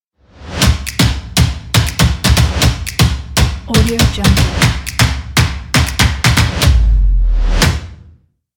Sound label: percussion ident